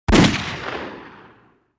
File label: shoot